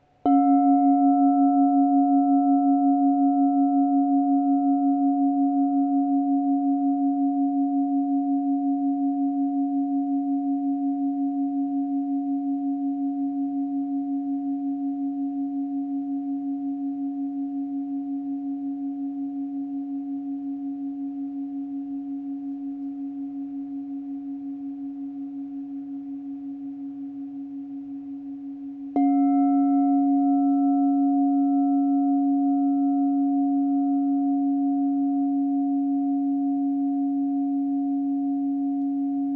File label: 7inch C# +5 chime, crystal soundbath